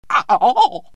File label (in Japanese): あほほほほ; Inai Inai Baa Oekaki Wanwan